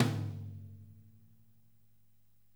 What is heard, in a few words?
S LO TMMF, DRY KIT; Spectrasonics; Burning Grooves